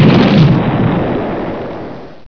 expl; netpanzer

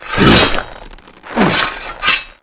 taunt; scourge

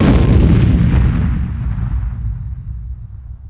boom; kimboot

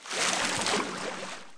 splash; AssaultCube

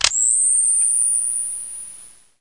stun; ufoai

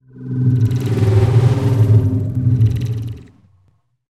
alien engine, ambience; ufoai

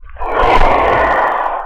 big angry, aliens; ufoai

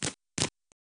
steps dirt; windstille

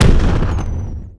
explosion; CriticalMass-1.0.0

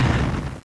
gunfire; barrage

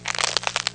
ice hit; blobAndConquer; combat

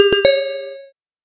doodooding; linux-virtual-jay-peak; soulride

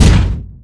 clank; trigger; evo

